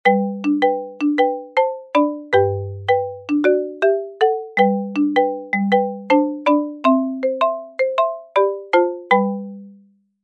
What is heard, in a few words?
call; dootask